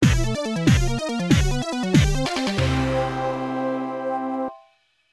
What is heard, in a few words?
Course Clear; the irritating maze; snk; neo geo